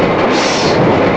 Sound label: PRESS; CMI Sound Library Rev 1.3